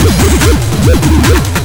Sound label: FX BEAT